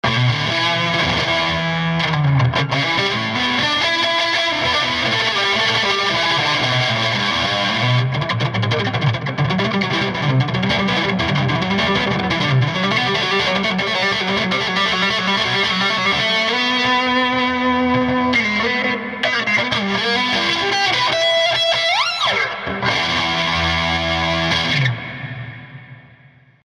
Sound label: basslab guitar jam